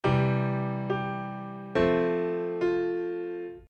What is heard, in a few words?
suspended